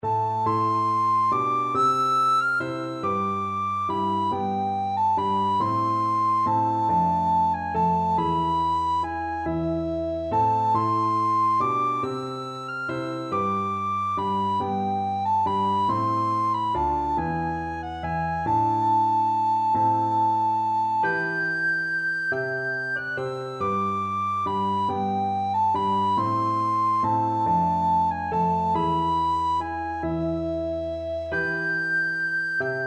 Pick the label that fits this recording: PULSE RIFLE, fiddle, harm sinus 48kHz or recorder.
recorder